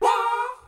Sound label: wah SUNG; ooh ahh